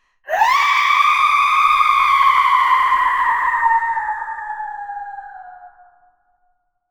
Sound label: a terrified blood curdlin